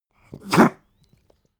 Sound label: pug gag smack medium; natur-tiere; hunde